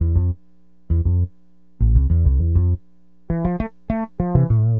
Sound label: BASS, Acid Jazz Funk